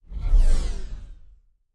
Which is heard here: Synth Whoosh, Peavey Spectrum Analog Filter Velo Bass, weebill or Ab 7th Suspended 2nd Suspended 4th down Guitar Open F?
Synth Whoosh